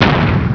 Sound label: explo; RedCat en de Spannende Stedentocht